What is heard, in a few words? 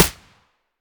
aps silencer mono; STALKER; IWP